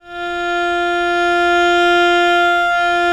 VL F3, VIOLE, Historical Instruments; USB Soundscan vol.23

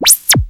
ZAPPO; Crypton - DrumBase5000

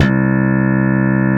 FAT BASS; SONIC ELEMNT; SONIC EL YES

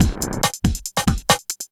Cheep Beat, Drum N Bass; Beats; Sonic Foundry (Sony Creative Software); Knutrix Drum & Bass

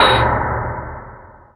Synth Perc; Dream Zone

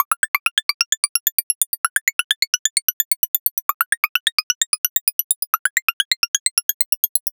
Synth Arpeggio, Synths; Eclectic Electronica

